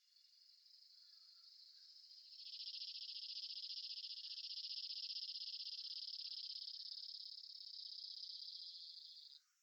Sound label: Cicada